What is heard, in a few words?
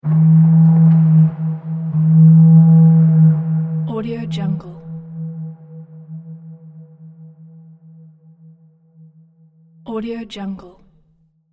2 large fog horn blasts with distant echoes